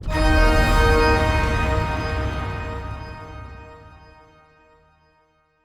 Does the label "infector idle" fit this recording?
No